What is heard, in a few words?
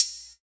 hat synth; Sonic Foundry (Sony Creative Software); Ugly Remnants VOL-2; Beatstuff